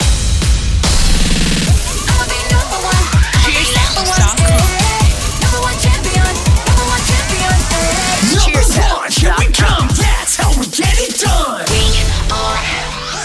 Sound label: number one theme